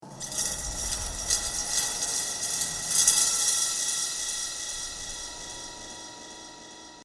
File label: prison chaine